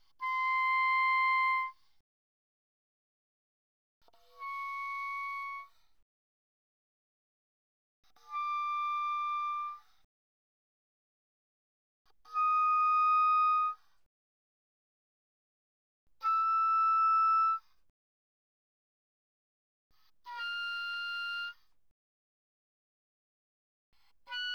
Oboe pp C6 Ab6, woodwinds

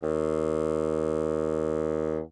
Bassoon ff Eb2; Woodwinds

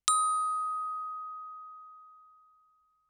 bells plastic ff Eb6; Percussion

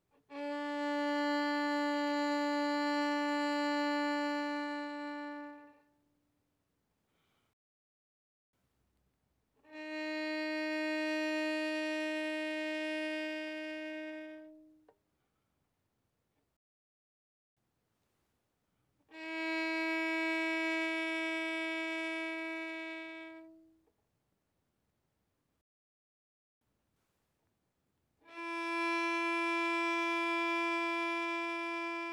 Violin arco mf sul D D4 A4

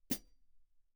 hihat, foot close, mf; Percussion; cymbals

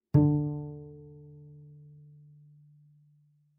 Bass pizz ff sul G D3, Double Bass; MIS Pitches - 2014; Strings

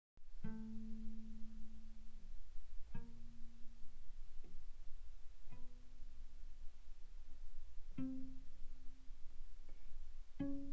Cello pizz pp sul A A3 Ab4; mono; Strings

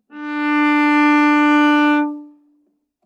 Viola arco ff sul G D4; MIS Pitches - 2014; Strings

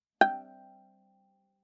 Viola pizz ff sul A G5; MIS Pitches - 2014; Strings

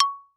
Xylophone rosewood ff Db6 stereo; MIS Pitches - 2014; Percussion

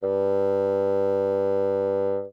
Bassoon ff G2; Woodwinds

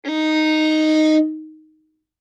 Violin arco ff sul G Eb4; MIS Pitches - 2014; Strings

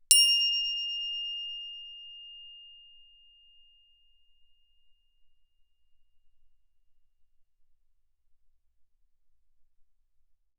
crotale F7 ff; Percussion; crotales

